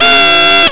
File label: party horn